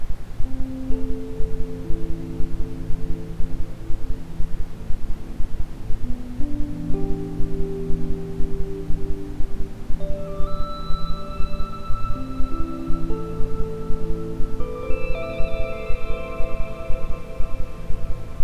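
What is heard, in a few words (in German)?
Iso Frequenzmeditationen Deep Astral Quickie mit Ambient Sound, Isochrone Töne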